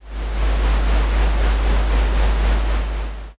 escalator

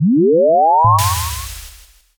warp jump

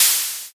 open hat

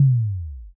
kick

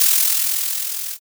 fizz

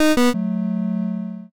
eliminate

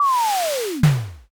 something falls

